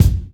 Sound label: acoustic bass drum F# key; kick drum; F sharp